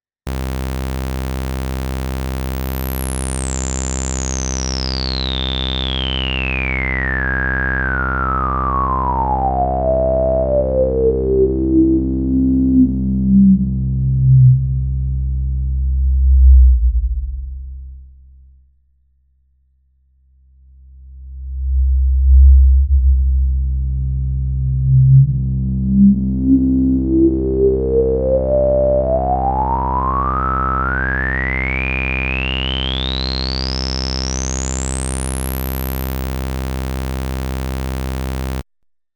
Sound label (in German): Saegezahn Dual Filter 12 dB Lowpass Resonance 70 Stereo Out; IRIDIUM